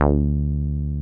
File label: 303 D3 p; Drums In Your FACE!